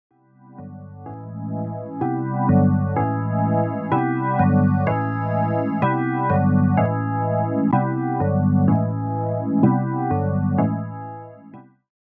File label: chords tool; One records